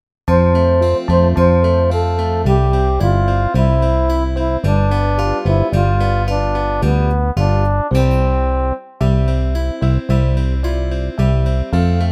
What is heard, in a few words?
Karaoke